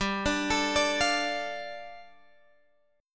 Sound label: G 7th Suspended 2nd G down Guitar Open G